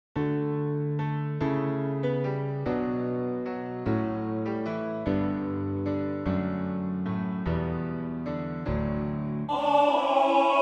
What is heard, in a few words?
Tenor